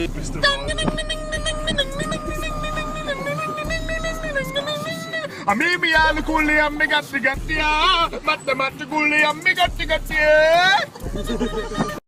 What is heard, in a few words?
indian type beat; memes